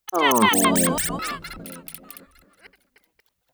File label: Vocal Samples, Sound Design; Sonic Foundry (Sony Creative Software); Pocket Diva World Class Vocal Samples